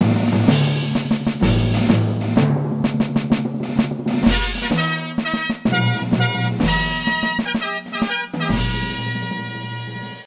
Game Over Loop; Johnny Bravo in the Hukka Mega Mighty Ultra Extreme Date o Rama 2009

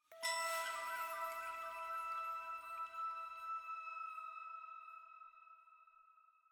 item Jingle Flute+ Chime E

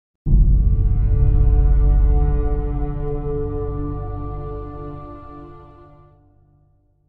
Game Over Theme; Mafia 2

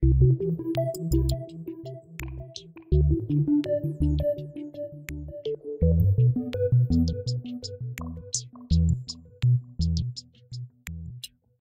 Moon Drops

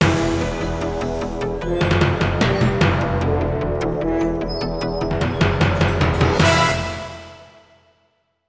Stinger; 911

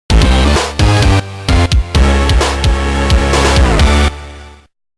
Mini Game Win; Dragon Ball GT Transformation; GBA

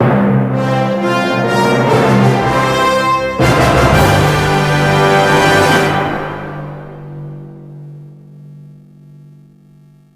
Stage Beginning Fanfare; the wonderful 101

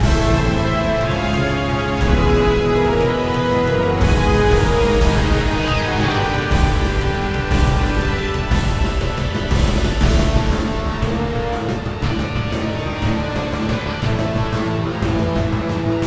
BGM Victory Norse; Age of Empires Mythologies; NDS

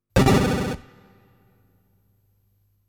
Door; Ys II; Music from Ys II

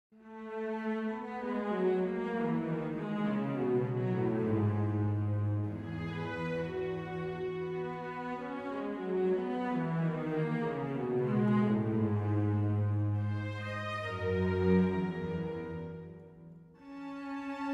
World Music

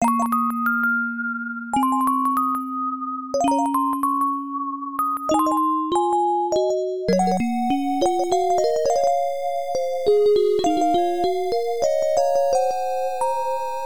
Orgel of Moonlight (Game Over); Mugen Senshi Valis II; PC-8801; OPN

